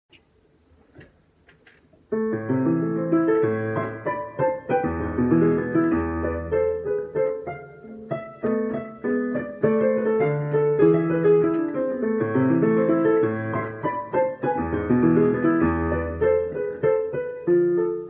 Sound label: Piano Recital; Chaplaincy